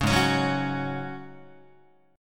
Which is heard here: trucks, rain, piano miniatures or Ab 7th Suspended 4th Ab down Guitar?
Ab 7th Suspended 4th Ab down Guitar